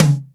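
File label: Acoustic mid tom, Roland MC-303 Toms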